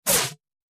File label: Short, Light Fire Extinguisher Air Blasts, X5